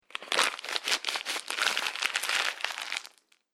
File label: Crunching Plastic Wrapper Noise; Crinkling Sound Effects; Foley